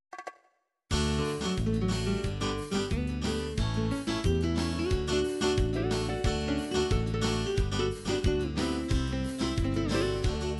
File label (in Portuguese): forro, midi; norte; abertura show